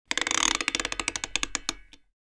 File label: pulling string